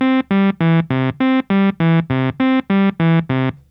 Nord Lead 4 arpeggiator down

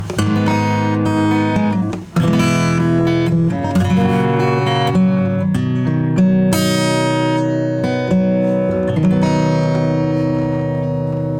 low pass 100; FirePresser TURBO 4

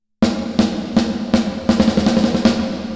Chorus High Detune Thicken Chorus

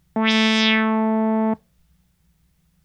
Nord Lead 4 Enve mod filter

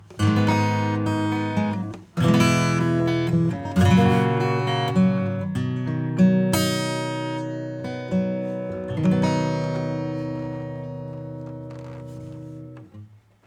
Harmonics acoustic bypass

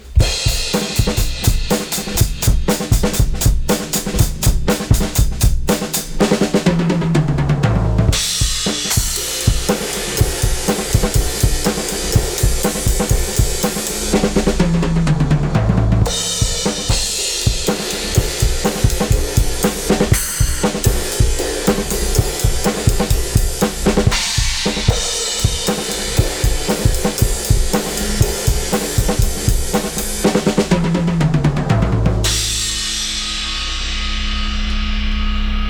drums; Royal Compressor

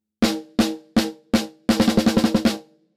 snare 808 Clap; Neutron 4

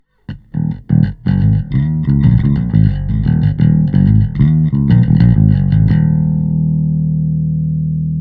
Bass

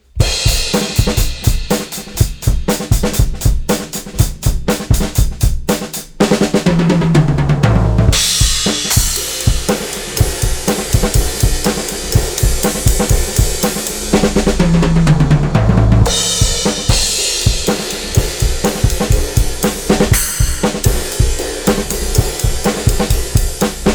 drums; SKYE Clipper; TRANSPARENT

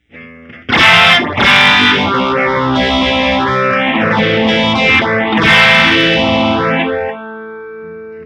Phaser Envelop; Instant

